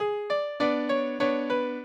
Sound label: minuet, mozart